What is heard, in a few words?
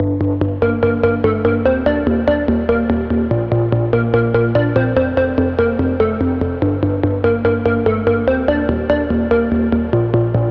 Pluck, Neon Circuit; Routed Sound; Vital Expansion - Reality Maker Vol.1